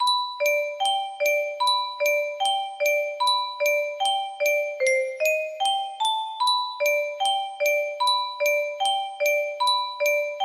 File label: glockenspiel guitar vocal